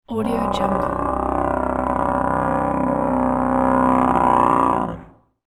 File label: low and hollow creature scream; sound ideas